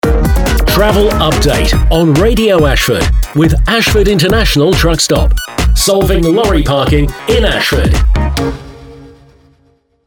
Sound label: Travel Outro Mixed; Radio Ashford